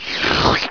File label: SLURP; sound effect; Nare Gewoontes